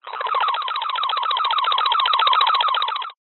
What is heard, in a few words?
science fiction radio signal interference burst